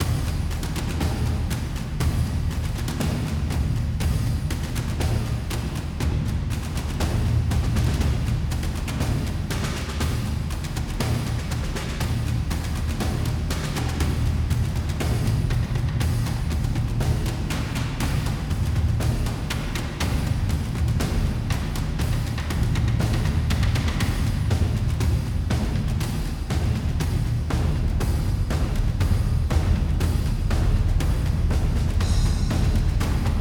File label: Grind Perc Option; Hollow Knight: Silksong